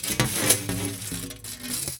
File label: phasing metal; Sound Design Tools; Raw Foley